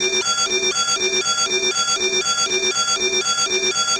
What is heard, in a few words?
foreboding; sonniss gdc